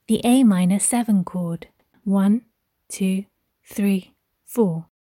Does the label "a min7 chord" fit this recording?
Yes